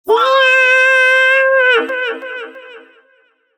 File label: slayeeeeeer